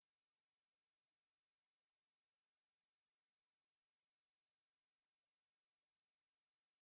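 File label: Goldcrest; koe; kikuitadaki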